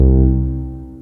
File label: Low Mellow Bass